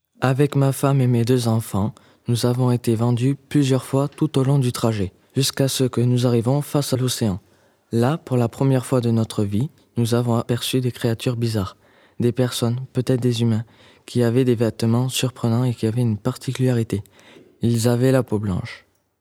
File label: transition